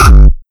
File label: Kick Dist Hardcord; Jochnhardtechno